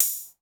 Hihat Open; Ableton Live 12 Lite; Core Library; Drums

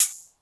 Hihat Closed

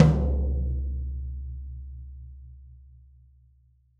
Tom Yesteryear; Drums

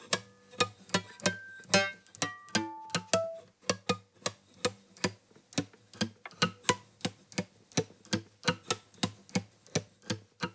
Guitar Strings Harmonics; Loops; Tonal; Ableton Live 12 Lite; Core Library

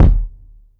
Kick

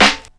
Snare, drums; Ableton Live 12 Lite Core Library

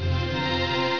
SYMPHONY; Canon; CameraWindow

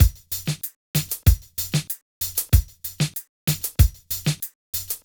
Two One Two 95 bpm, drums; Loops; Ableton Live 12 Lite; Core Library

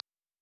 navigation; pegasus-frontend; gameOS

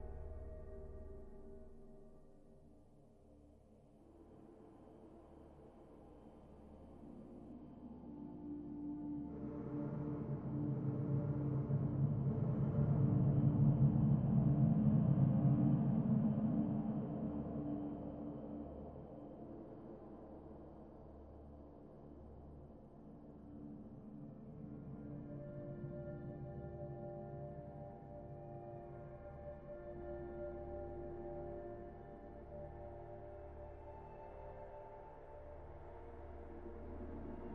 score Graveyard; Journey

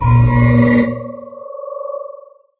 hoverbug start; There; ThereClient

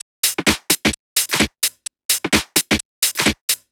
Hats and Snare UK Garage 129 bpm; Loops; Drums; Top; Ableton Live 12 Lite; Core Library

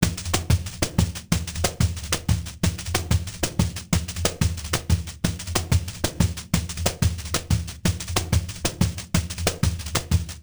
Pandeiro 184 bpm; Loops; Drums; Percussion; Ableton Live 12 Lite; Core Library

